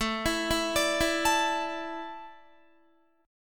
A Suspended 4th down Guitar; Asus4; arpeggiated

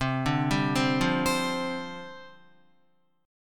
C Suspended 2nd Suspended 4th, down, Guitar

